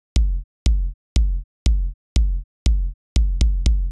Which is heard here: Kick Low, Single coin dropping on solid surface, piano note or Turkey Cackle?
Kick Low